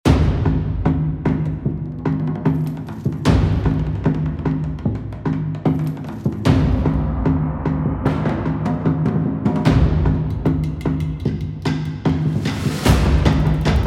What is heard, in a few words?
war drums